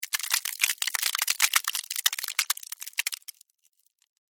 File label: Weather Ice Crack; Snow